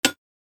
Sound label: button